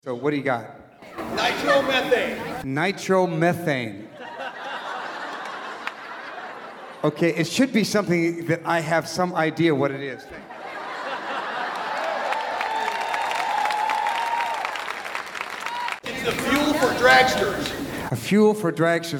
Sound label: improv intro; Nitro methane